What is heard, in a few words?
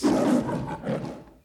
roar deutsch; dvd se; mufasas roar